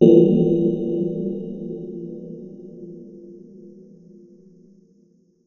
big clank

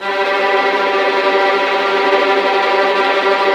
STR VLN TR, Vlns Tremolo; Roland - String Master Series

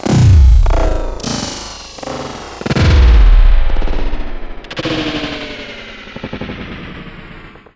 Stretchy Beats C2; Zero-G; Club Techno

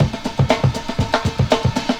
shivery

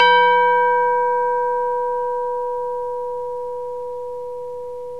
VOICE & BELL

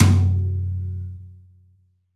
New Brush Tom, Roland BK-5

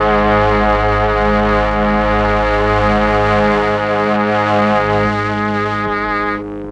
MEL STRING; ILIO; Propeller Island

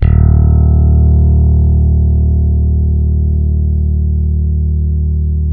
E 2, compresence, bass; Spectrasonics; Bass Legends

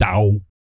BASS FX; ILIO; Vocal Planet VOL-3; Jazz & FX

